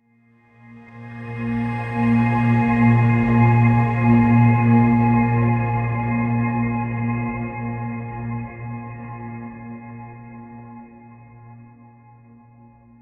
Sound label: ambient pad swell soft; underscore